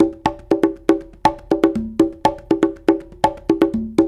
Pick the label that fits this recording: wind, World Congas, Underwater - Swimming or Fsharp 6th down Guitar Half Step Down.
World Congas